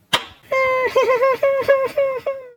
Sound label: hit; basic games; mcpgames; cooties